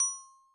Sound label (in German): Glocken H, Yamaha DTXpress-II Instruments